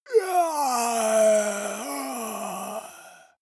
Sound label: monster die; kreaturen